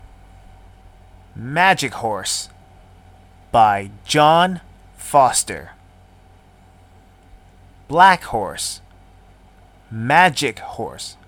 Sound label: Magic Horse slowed down